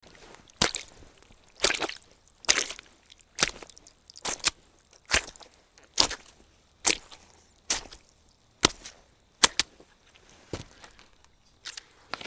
walking in a mud puddle

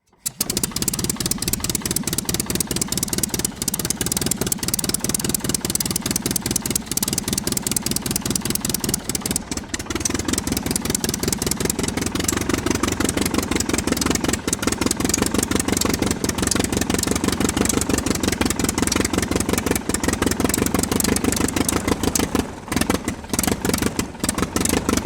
motor running aircraft

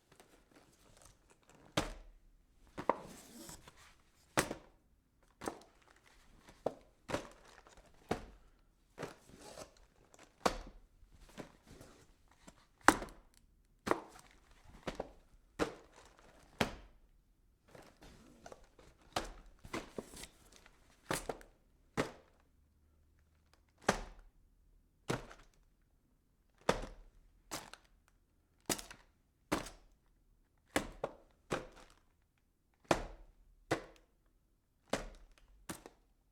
packing boxes

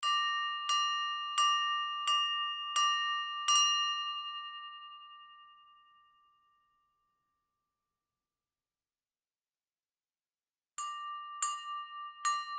silverware on glass toast

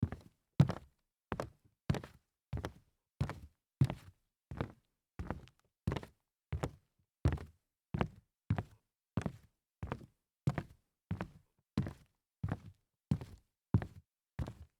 sneaker walk hollow wood, foley

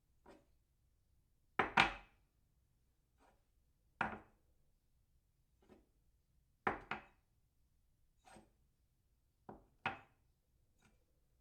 cup on saucer

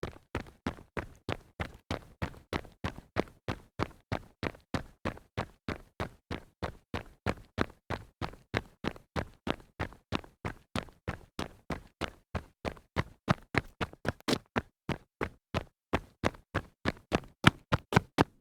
running on concrete

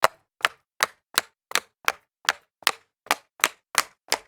jog down concrete stairs

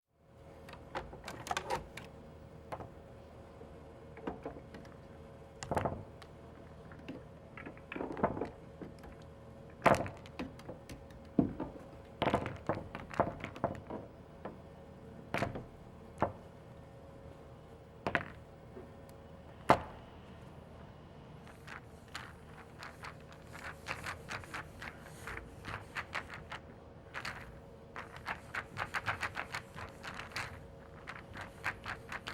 pool table balls rolling

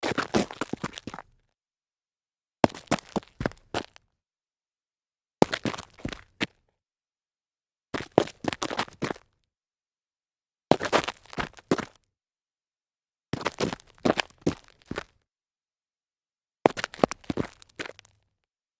dress shoe stumble gravel

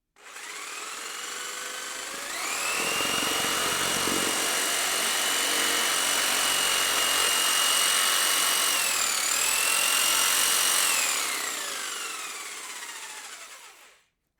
skil saw motor run

